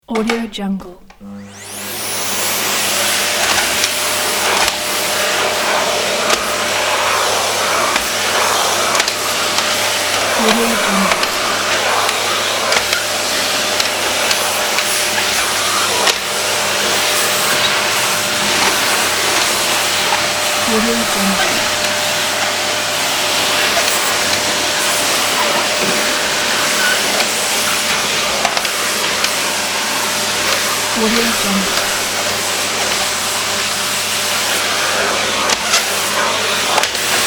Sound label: vacuuming the floor long